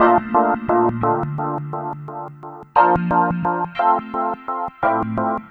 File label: Soulgroove, Funk Keys; Sonic Foundry (Sony Creative Software); Synchro Funk